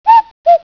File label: cuckoo